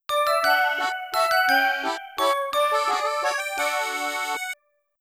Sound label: Greeting Message; Wii Room (Shopping Update) Soundtrack